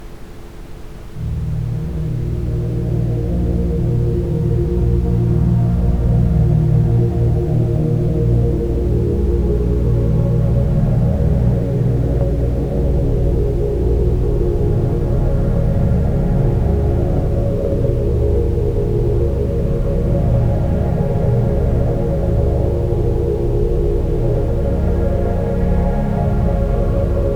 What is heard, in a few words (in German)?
mit Ambient Sound; chakren; Hridaya